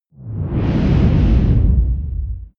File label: whoosh mid tonal